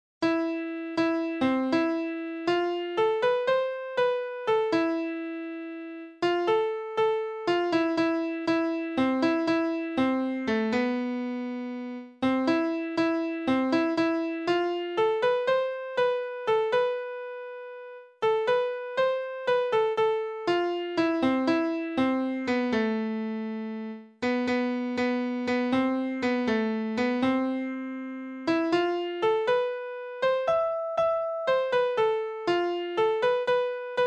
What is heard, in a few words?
piano